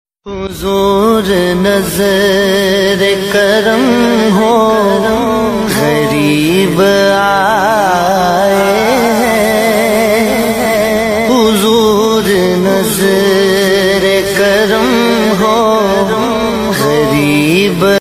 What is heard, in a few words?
islamic Ringtones